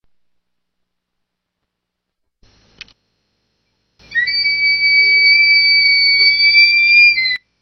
canto do gaviao preto

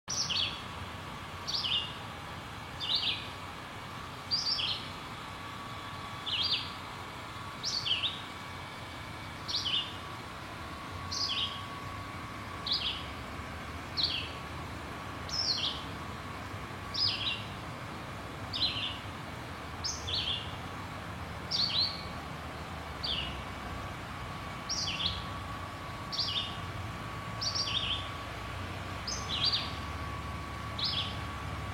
Canto do Juruviara boreal

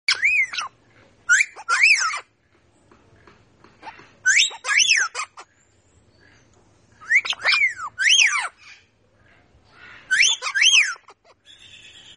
canto do papagaio de hispaniola

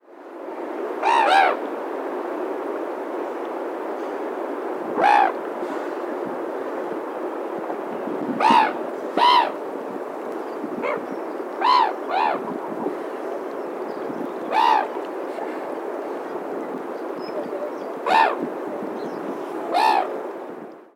canto gaivota prateada

